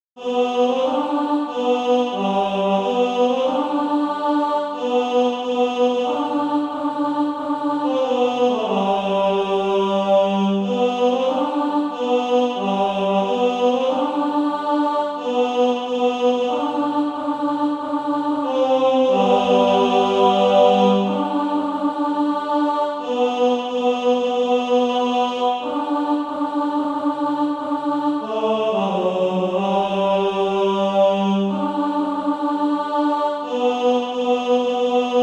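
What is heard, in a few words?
tenor, SATB Practise Music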